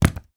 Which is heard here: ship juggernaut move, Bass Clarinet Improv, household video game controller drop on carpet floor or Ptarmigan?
household video game controller drop on carpet floor